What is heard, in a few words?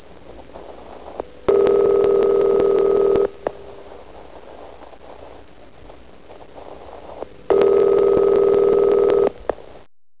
PHONE